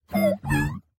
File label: Doo Woo; Bastion